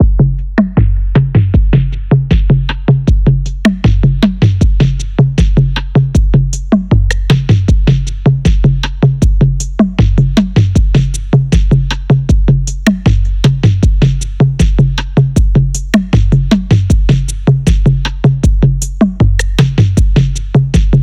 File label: OCEAN BEAT